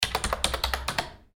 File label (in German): computer tastatur